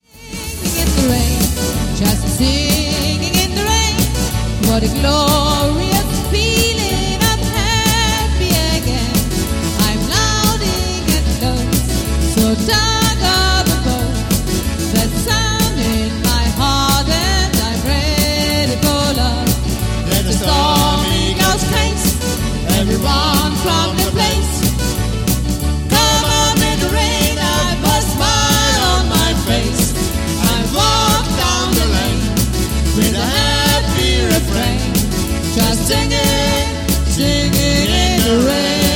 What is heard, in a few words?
Singing Duett